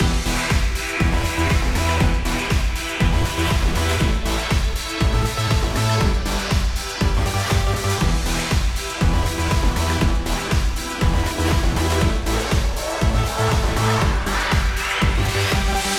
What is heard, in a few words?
Club Music Inside; Hatred